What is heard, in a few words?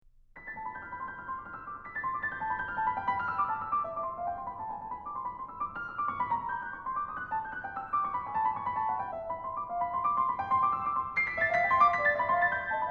gigue; dancetudes